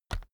keyboard external key push

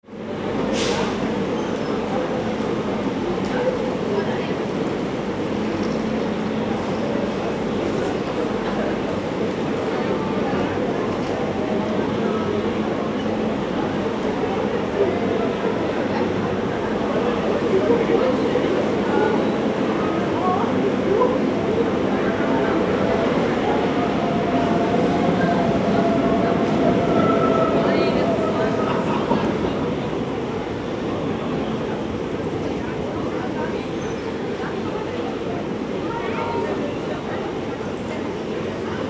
railway station cologne

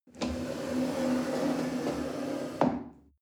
chair wood slide on floor soft bump

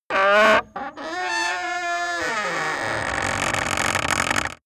wood gate close creak heavy

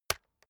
keyboard external space hit push

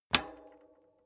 bicycle street foot pedal hard

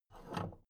big plastic case handle take

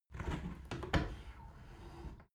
window open tilt soft sequence

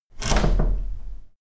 door key in out

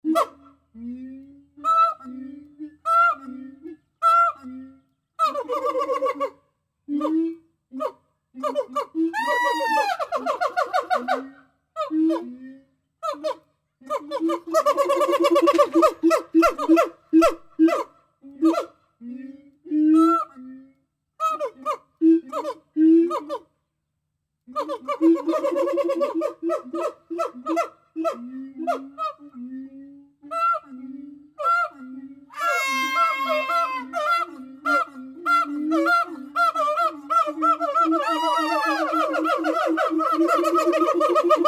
gibbons

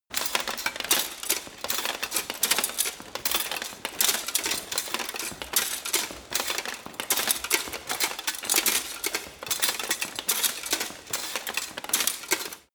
walk sequence

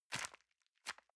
envelope grab release medium

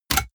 click

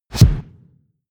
push

